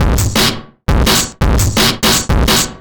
FX Beat, Drum N Bass; Sonic Foundry (Sony Creative Software); Knutrix Drum & Bass

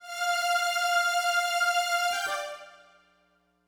Smooth Groove Strings, 70s Analog Funk

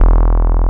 BASS, Drum & Bass, 170 BPM; Ueberschall; Drum & Bass Resonance

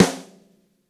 Gretsch Snare Room; 1967 Gretsch Kit; One-Shots; Sonic Foundry (Sony Creative Software); Vital Drums

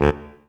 SHORT SPIT D; Greytsounds - Scott Peers Sound Engineering

